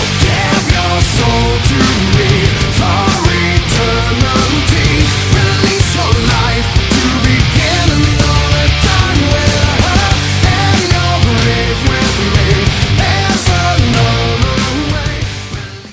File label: metal; roundsound